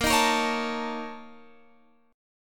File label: A sharp Minor Major 7th Double Flat 5th